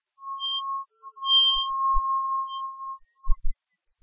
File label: equipment stress; ambient; Source Engine; cstrike